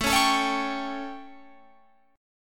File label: A 7th Guitar Open F, chord; strummed